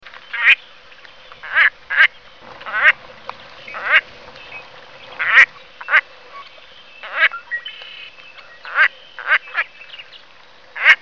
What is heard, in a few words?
The sounds of birds